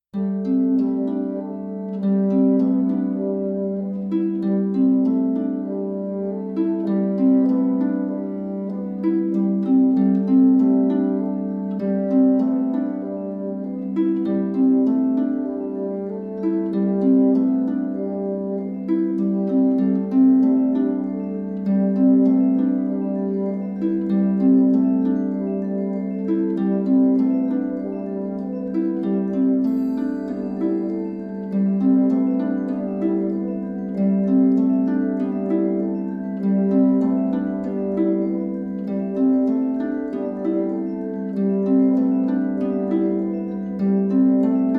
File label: Ambient